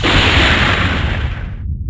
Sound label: lightning strike